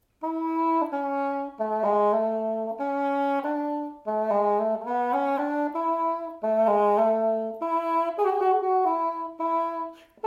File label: Oboe da caccia